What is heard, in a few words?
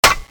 violingun clip out; csco; csgo